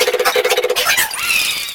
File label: Fan Chant; Mario Paint